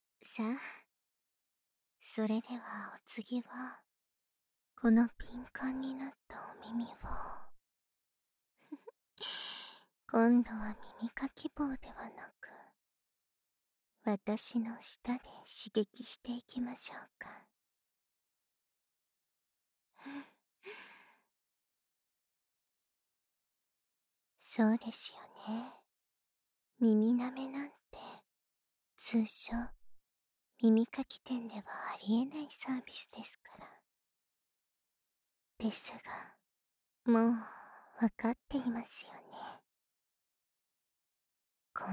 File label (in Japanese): 誘惑耳舐め～熱い吐息ととろとろ耳舐め～; 右耳